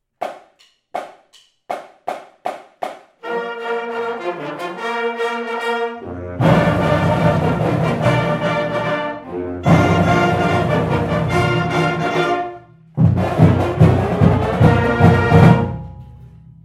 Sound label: fanfare